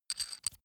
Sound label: metal screw nut single